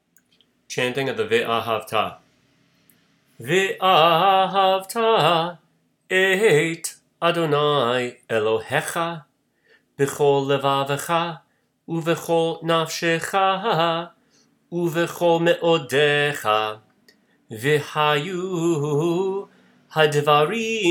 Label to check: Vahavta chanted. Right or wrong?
Right